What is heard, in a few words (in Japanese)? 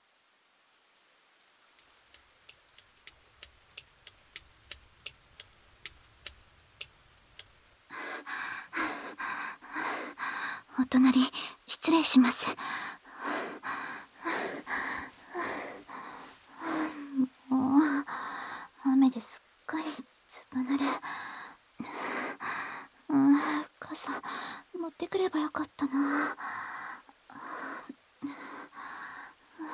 会話・ハグ・おっぱい揉み・キス・耳舐め・耳舐め手こき♪, ASMR